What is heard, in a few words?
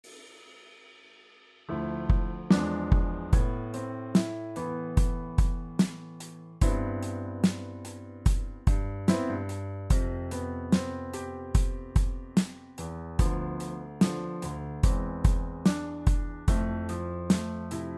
ballade piano